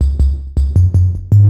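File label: synth bass, drum and bass, 160 bpm, C; eJay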